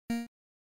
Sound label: keypress invalid